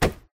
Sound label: Hit; Poppy Playtime; HuggyWugg